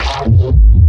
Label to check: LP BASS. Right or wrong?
Right